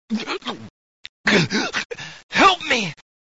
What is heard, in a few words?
ferry